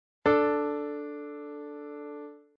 Intervalle Quinte D; Reine Verminderte Uebermaessige Intervalle

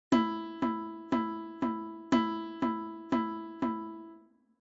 Notenwerte Viertel

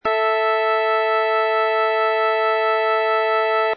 Quinte; werckmeister - stimmung

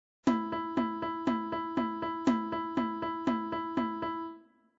Notenwerte Achtel

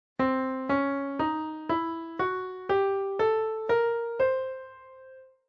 Tonleiter C Halb Ganz; Weitere Skalen; Tonarten

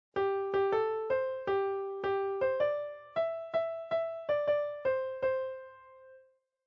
Balkensatz

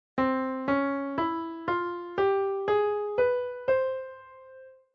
Tonleiter C Zig Dur; Weitere Skalen; Tonarten

